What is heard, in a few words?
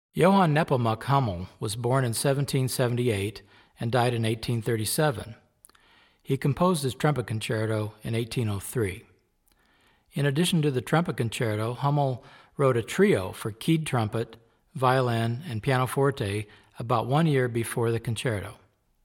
Concerto in E Major, Classical Trumpet; Masterclass Series - Classical Trumpet